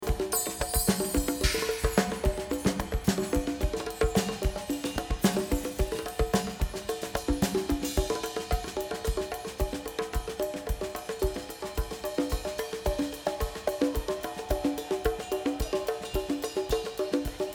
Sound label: Drums